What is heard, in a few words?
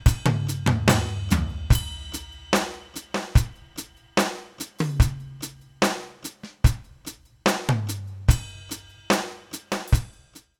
drums, Kurzweil K2700